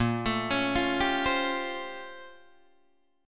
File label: Bb Minor Major 13th Bb, Guitar, DADGAD; BbmM13; arpeggiated; 8,12,11,10,10,10; JGuitar